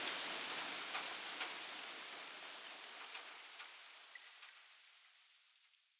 cargo rain fade out; CrossCode

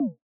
menu blocked

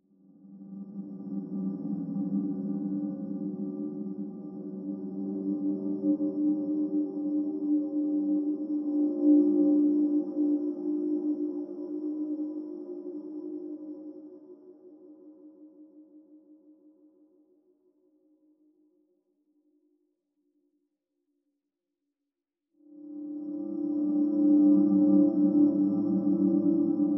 cave default, music ambience; The Swapper

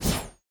close combat sweep; CrossCode; battle

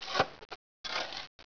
quarry; Caesar 2

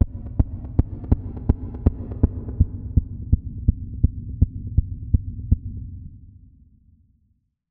intro bumping; The Swapper

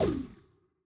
ball bounce heat; CrossCode; battle

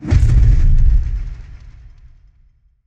impact deep; The Swapper

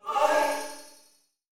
crystal enemy; battle

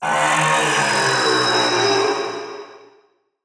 mega moth scream; temple; battle

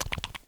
wiggle; World Of Goo

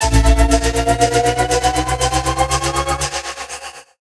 element shield duration ending

